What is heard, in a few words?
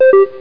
leave chat